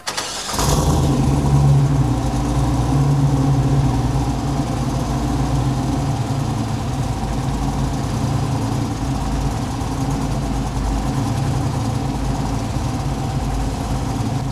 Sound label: Warm Idle; ls1 montecarlo ss